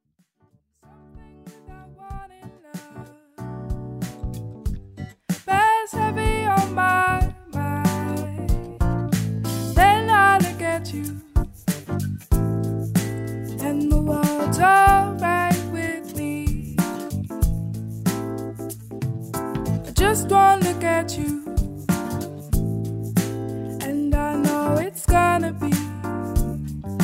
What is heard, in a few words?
Vocal